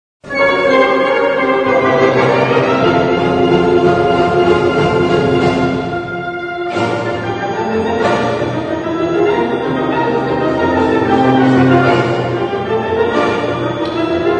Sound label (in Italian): galop danza delle ore